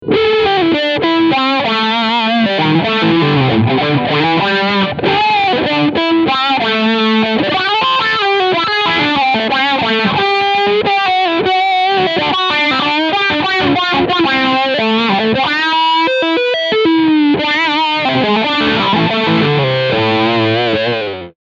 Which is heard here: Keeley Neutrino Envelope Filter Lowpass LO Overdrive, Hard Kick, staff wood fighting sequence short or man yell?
Keeley Neutrino Envelope Filter Lowpass LO Overdrive